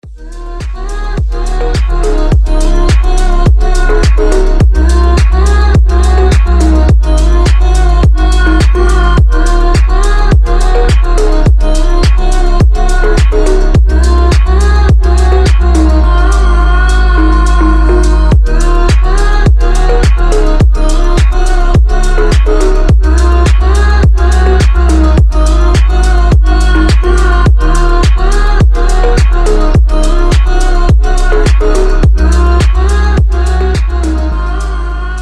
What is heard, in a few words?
deep phonk